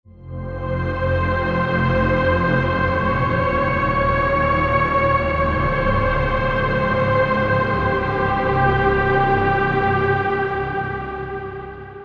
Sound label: Pad; Mind Resonance; Routed Sound; Vital Expansion - Reality Maker Vol.1